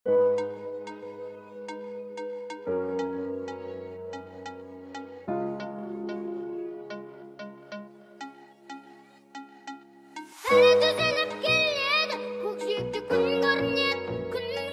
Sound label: remix